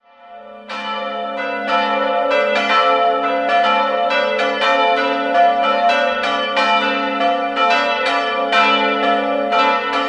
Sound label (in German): Weissenburg, Pfarrkirche, glocken; Willibald; 1952 von Karl Czudnochowsky in Erding gegossen.